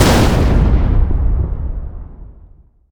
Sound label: start explode; space tunnel